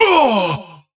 Heavy Wound; Herald; battlerite

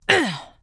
Grunt Attack; Tyra; Paladins

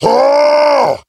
Heavy Attack; Battlerite; Glutton

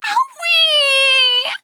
Ouch; Skye; paladins

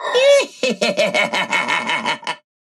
Laugh; Paladins; Alchemist

